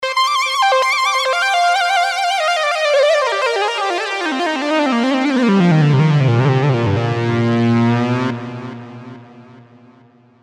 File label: classic saw solo; opxpro